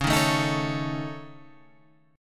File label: C sharp Suspended 2nd Sharp 5th C sharp, chord